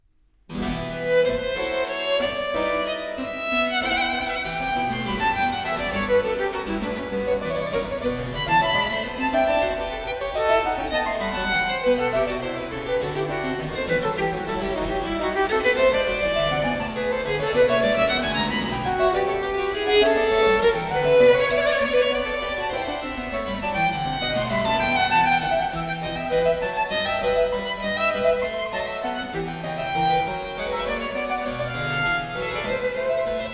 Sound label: Sonata No. 5 in F Minor, Vivace, Violin and Harpsichord; BWV 1018; Bach; J.S. Bach